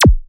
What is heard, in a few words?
Robo Kiss